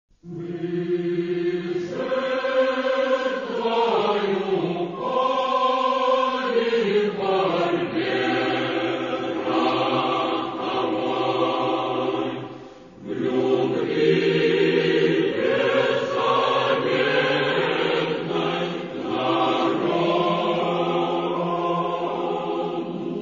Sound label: weeping